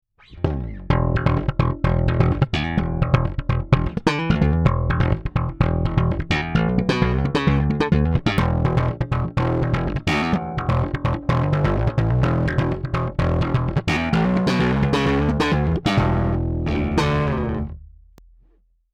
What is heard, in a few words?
Slap; RW Factotum